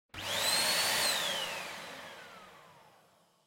Vacuum Cleaner Vacuum; 掃除機; バキューム

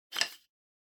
Hourglass, Noise; 砂時計02-6(ノイズ); タイマー機器

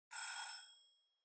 Kitchen Timer Far Alarm Short

